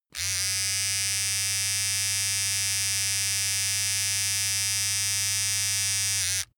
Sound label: razor small long